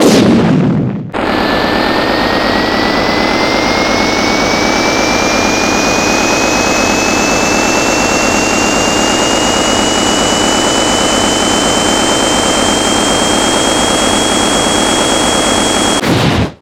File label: SFX Item Bullet Bill; Mario Kart 8